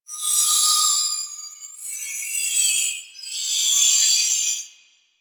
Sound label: Valley Night Critter; Ambience; Warframe; Quests; The New War